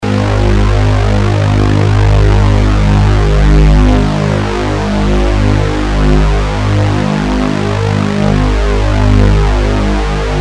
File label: tri saw